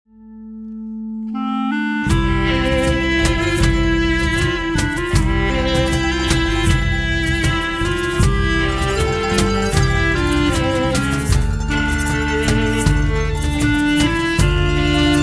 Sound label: Nihavent Oriental